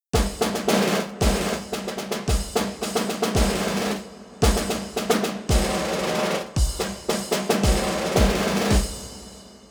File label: Music Main Menu; Army Rescue; Wii